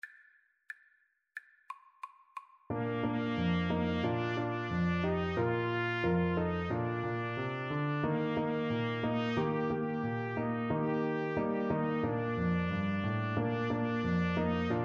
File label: do lord 2 TPT